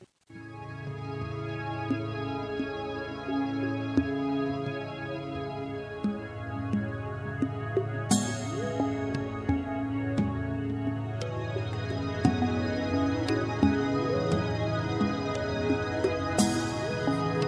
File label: ambient with nature; taniec sziwy